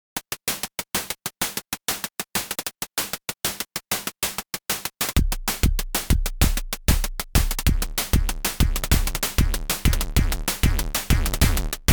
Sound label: have gabber kick will use opl3; weeklybeats 2026